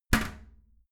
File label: toilet lid close heavy, badezimmer; haus-garten